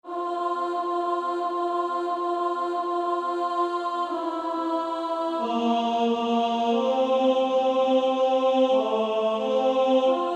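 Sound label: Female Choir, alto; Onward Christian Soldiers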